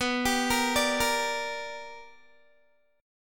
B Minor Major 7th Sharp 5th Guitar; BmM7#5 arpeggiated

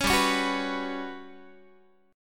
G Major 7th Flat 5th, Guitar; GM7b5; strummed; 16,15,16,16,15,x

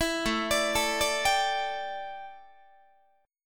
A 7th Suspended 4th, A, down, Guitar; A7sus4 arpeggiated

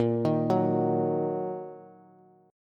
A sharp Suspended 4th Sharp 5th Bass; arpeggiated; A#sus4#5; JGuitar

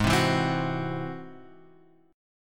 G sharp Augmented 7th G sharp; G#+7 Chord {4 3 2 5 X 2} strummed

